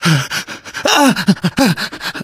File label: exertion critical, survivor voice, manager; source engine; left 4 dead 2